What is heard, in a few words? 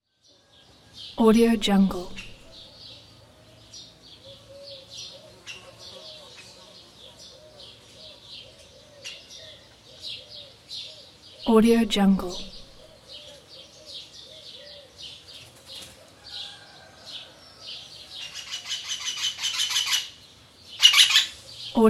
village ambience